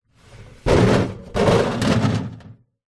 household drink soda cans multiple inside bar fridge drawer slide in or out